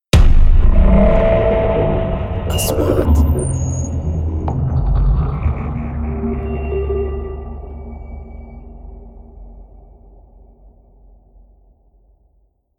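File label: 3D SFX Trailer; مؤثرات تشويقية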